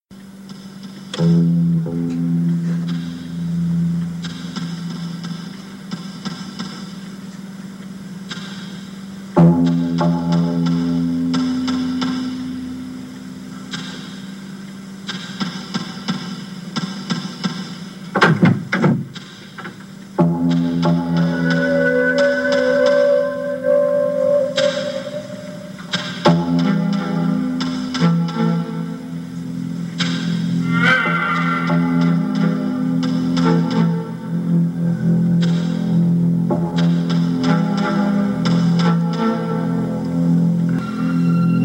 sad theme

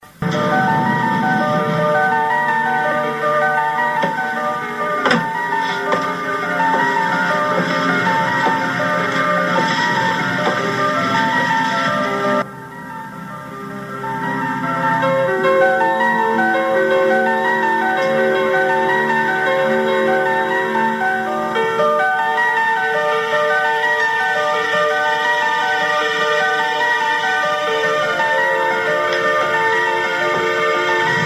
creepy piano

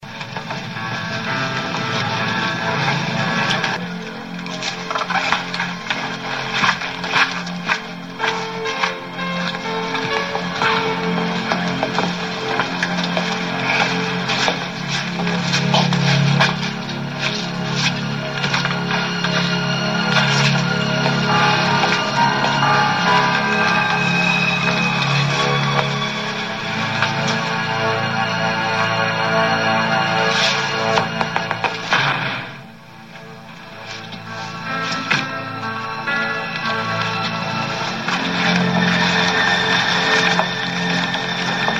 suspense theme

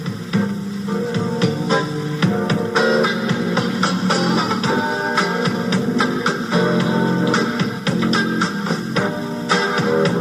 romantic theme

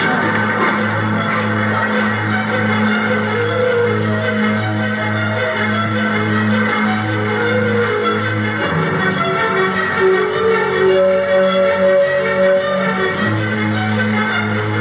dramatic theme

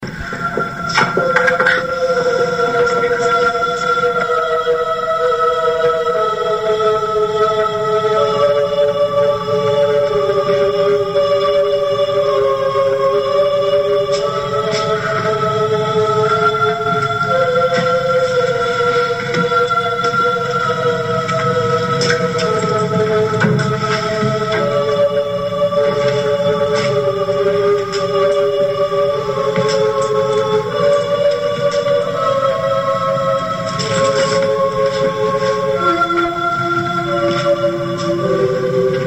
church theme

